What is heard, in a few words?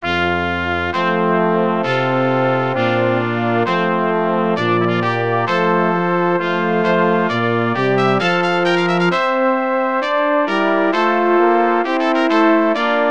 for brass quartet; hoe schoon lichtet de morghenster